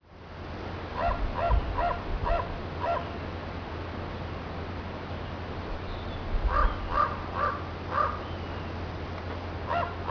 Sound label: ravens